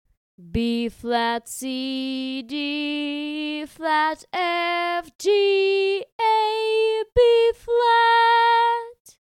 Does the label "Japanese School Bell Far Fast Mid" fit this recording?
No